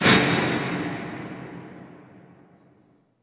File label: fail sound; saiyku [rby] instafade